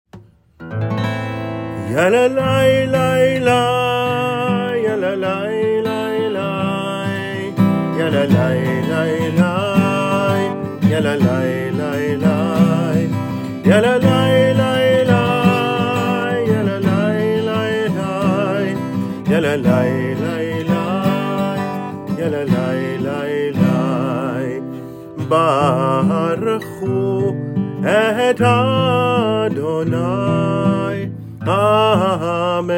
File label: Bar chu With Guitar